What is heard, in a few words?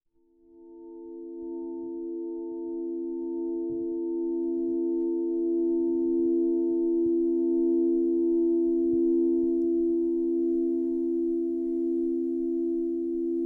Harmonised Healing Set, crystal singing bowls